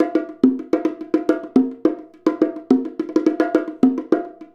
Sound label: Bongo; Drums; Sonic Foundry; Essential Percussion